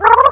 gurp; quake2; karrot

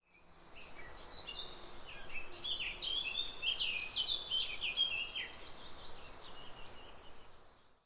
scott bird; quake2; jitspoe

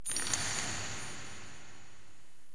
chain clink; quake2; chaos

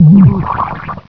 gurp; quake2; ernie

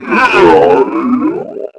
death; quake2; zumlin

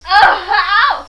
pain; quake2; chastitymarks

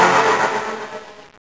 gurp; quake2; okta

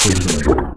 death; quake2; bdroid

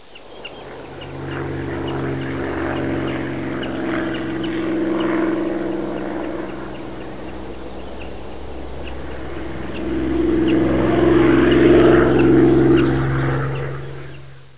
flyby; quake2; cherbourg

